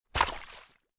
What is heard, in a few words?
impact rock thrown in puddle